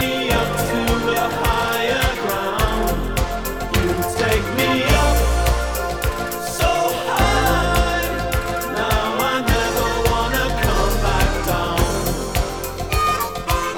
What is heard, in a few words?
vinyl